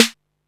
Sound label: snare, techno 138 BPM